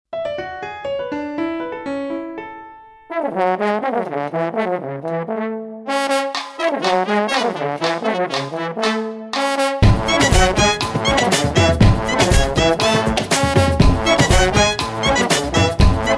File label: Nokia Band Mix